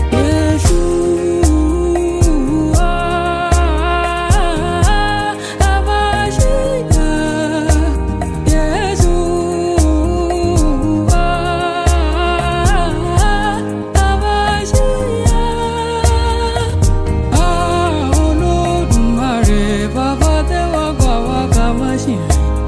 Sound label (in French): musique